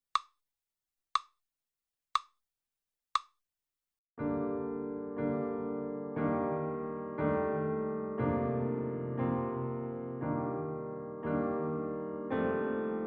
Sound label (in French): accompagnement